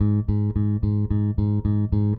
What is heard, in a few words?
Eighth Notes G#, Bass; Bass X